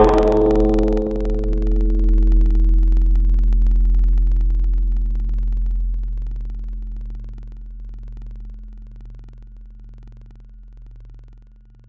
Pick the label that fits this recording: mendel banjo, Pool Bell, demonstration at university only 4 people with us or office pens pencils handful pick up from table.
Pool Bell